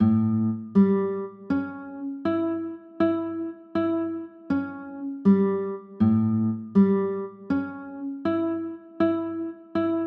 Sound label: a 6, chords